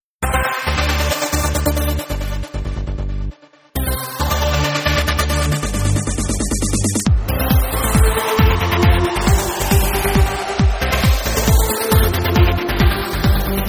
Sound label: Trance Up; ToneRack